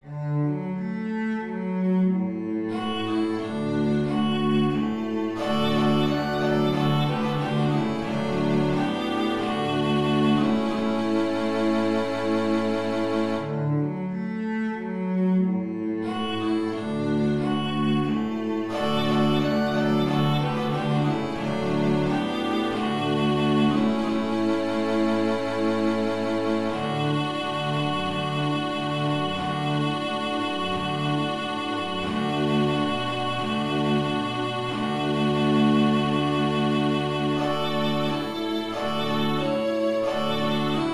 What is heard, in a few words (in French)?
Quatuor pour cordes